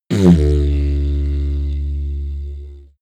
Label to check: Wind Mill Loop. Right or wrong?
Wrong